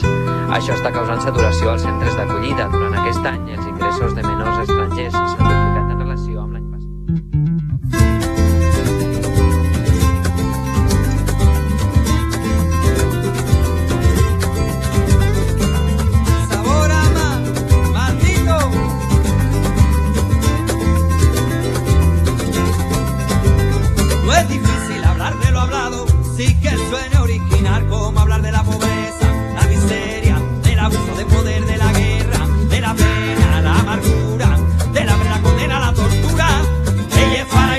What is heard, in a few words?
Flamenco Chill